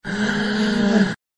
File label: gasp, reverse